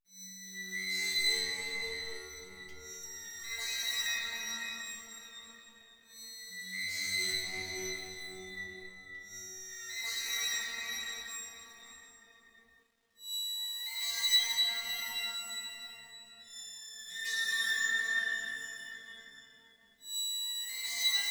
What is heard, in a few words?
noise, dark ambient